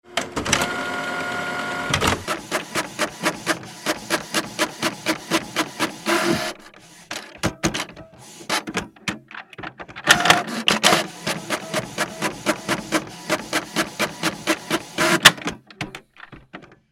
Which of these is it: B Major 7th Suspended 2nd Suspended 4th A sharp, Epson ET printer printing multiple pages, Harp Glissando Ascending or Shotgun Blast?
Epson ET printer printing multiple pages